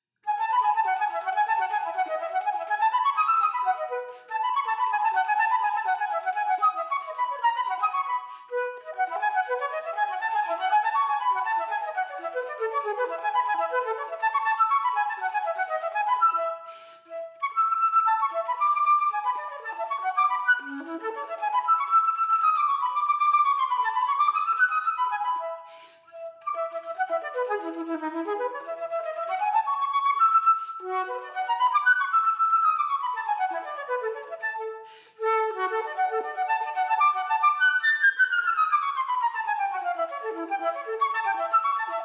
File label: flute performance